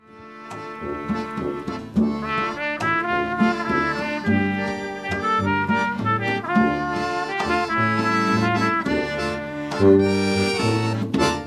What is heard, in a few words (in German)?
langsam